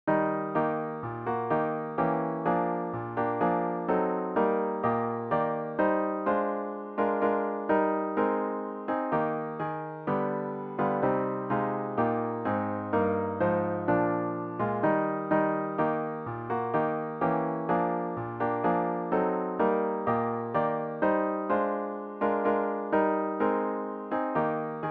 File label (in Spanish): campanas de navidad